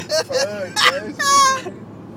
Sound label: Laugh